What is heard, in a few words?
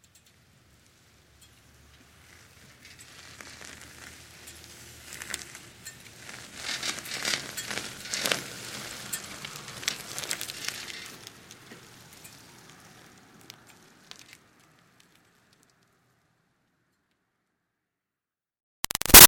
Bicycle Retro Cruiser By Crawl Wobbly; Slow Crawl; Pedaling; Bicycles; Vehicles; Big Room Sound